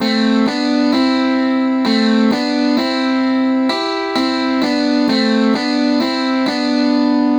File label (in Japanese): 6度ハモり